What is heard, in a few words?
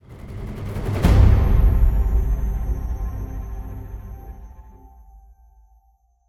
Tension Stop 57.5bpm 2bar; project zomboid